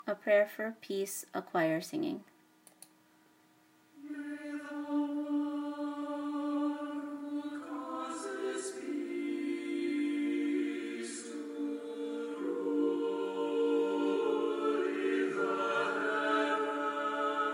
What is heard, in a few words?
Full Choir Singing; High Holidays